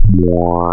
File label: FFT Add 4ths; Digital; Synthetic's Serum Collection